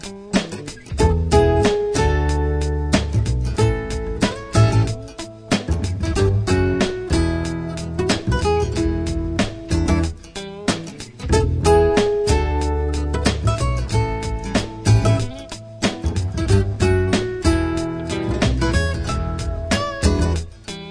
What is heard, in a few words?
Ingame Music; 50 Classic Games 3D; 3ds